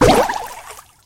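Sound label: attack hit; clancy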